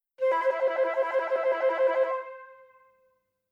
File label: tremolo